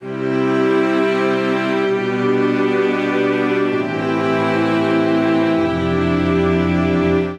Progression Eternal I-V i-vimin7-IVmaj9 C Major 130 bpm, String Quartet; MIDI Clips; Ableton; Factory Packs; Spitfire Audio